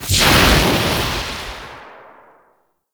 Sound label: Air Burst; Warframe; Tengu